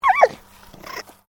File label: Animal Alpaca Female Grumble; Alpacas on a farm in Gippsland Victoria; Miscellaneous Animal